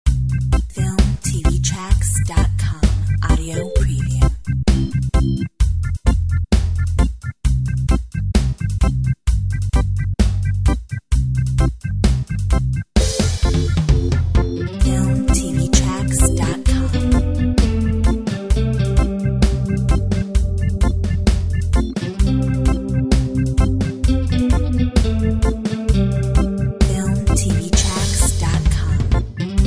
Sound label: country reggae